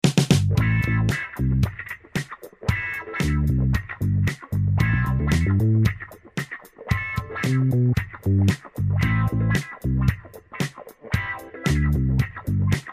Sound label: 70s Music